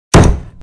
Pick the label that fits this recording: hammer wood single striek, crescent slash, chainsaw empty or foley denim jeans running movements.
hammer wood single striek